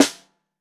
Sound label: SN Bottom, Snare; Ableton Foundation FreePacks; Snare Designer